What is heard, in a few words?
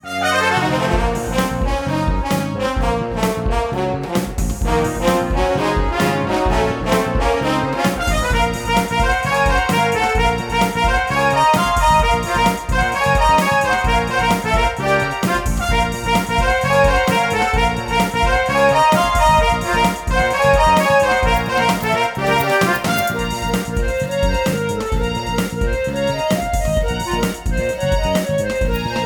battle; Aveyond 4 Shadow of the Mist; RPG Maker